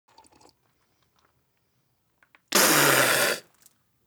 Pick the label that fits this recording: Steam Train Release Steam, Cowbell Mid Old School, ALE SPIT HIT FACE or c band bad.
ALE SPIT HIT FACE